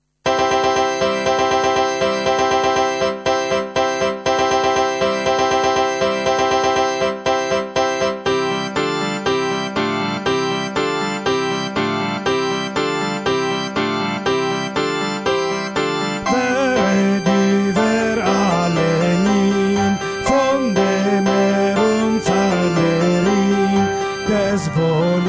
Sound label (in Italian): contralti; Carmina; Were diu werlt alle min